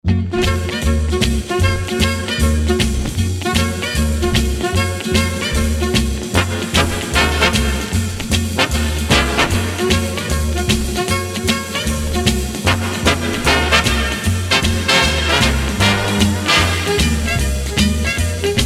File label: swing